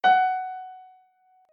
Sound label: f#4, piano